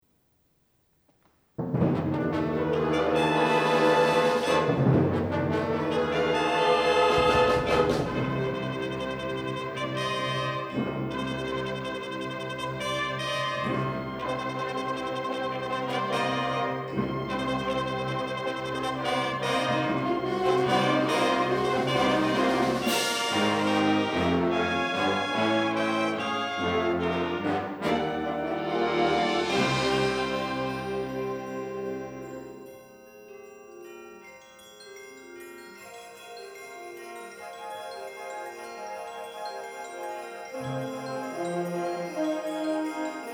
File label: Fanfare; Gavorkna